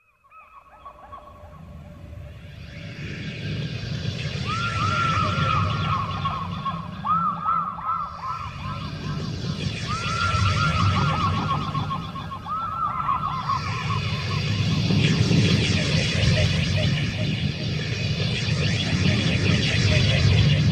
Fanfare

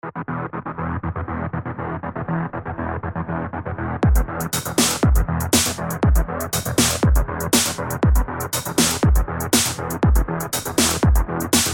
Trance; Fruity Loops

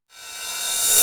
Ride 909 R, Reverse; Ableton

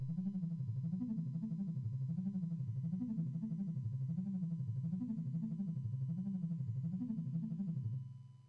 Audio, Arpeggio to PSR-90; Ableton

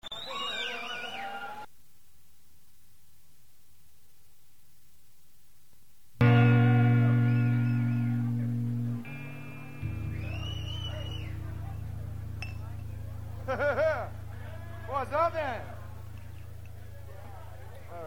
Tuning; Fox Theatre - 1995

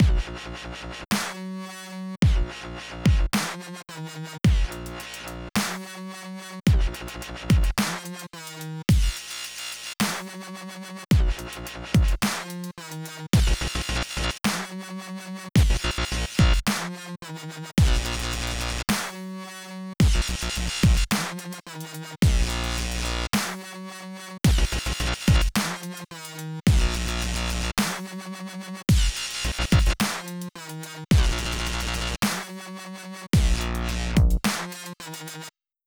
Dubstep

